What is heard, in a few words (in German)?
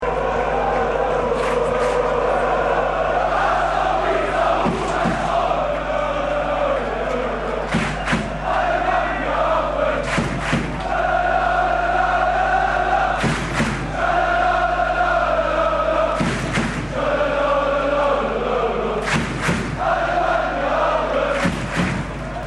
buuuuuuuuuh rufe fussball fan; fangesang; fussball alemannia aachen; arschloch wichser hurensohn